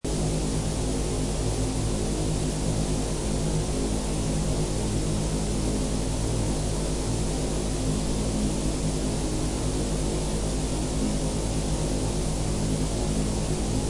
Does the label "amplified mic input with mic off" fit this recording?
Yes